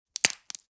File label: Foil blister pack pressing tablet or pill out; medicine